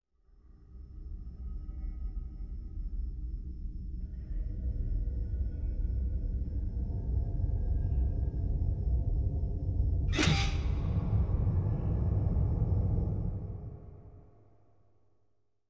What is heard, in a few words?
Creeping Effect, Horror; Sound Design - Horror; Sony Pictures Sound Effects Series Volumes 1-10; Sony Creative Software